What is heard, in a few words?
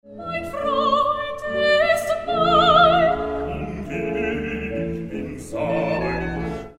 mein, choral de bach, cantate